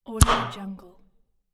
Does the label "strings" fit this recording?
No